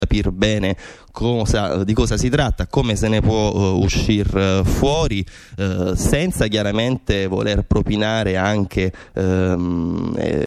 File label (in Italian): piano